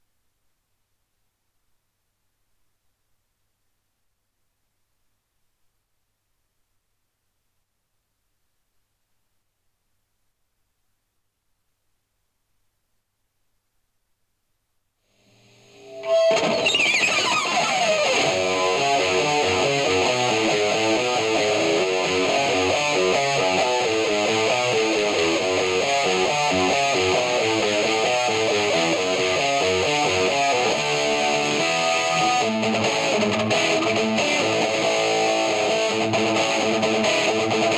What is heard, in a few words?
guitar